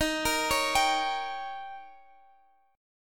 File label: D sharp 7th D sharp, Guitar, Standard; arpeggiated; D#7; x,x,13,15,14,15; JGuitar